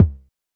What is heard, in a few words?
Polite kicks C2, Short; LIMAT Div Dept; Drums